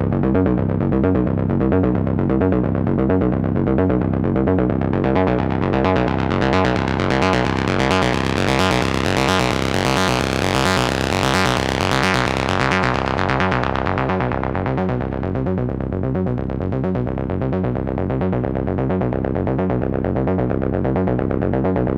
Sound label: Bass A